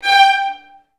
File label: VI G4, Advanced Orchestra; Best Service